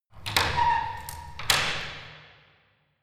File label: locking church door